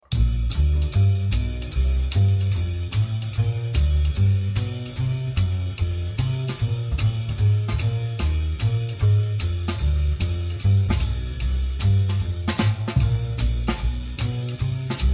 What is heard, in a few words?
Bs Dr slow